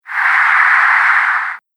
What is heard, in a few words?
Fast Moving Ghost; Ghost Sound Effects; Horror; Andrew Fleming